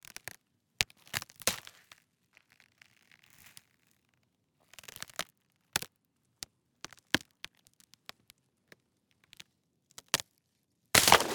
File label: ICE Thin Cracked Crust on Lake